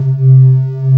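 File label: PAD Matrix Pad C3; VK Matrx; Best Service Gigapack 1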